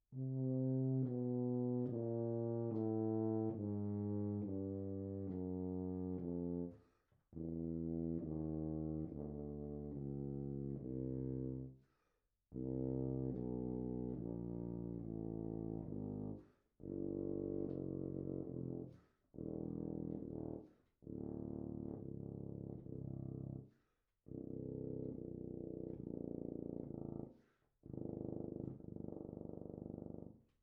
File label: tuba chrom 48khz